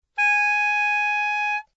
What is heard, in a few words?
A sharp 5, soprano saxophone